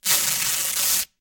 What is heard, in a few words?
velcro unfastening; sonniss gdc